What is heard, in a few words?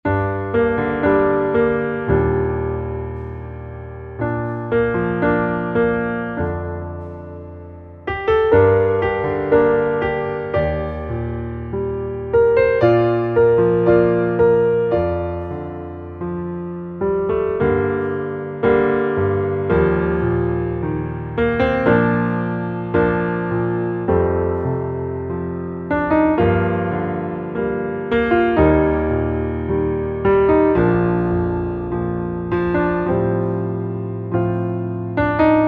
Underscore PIANO; Worship Flow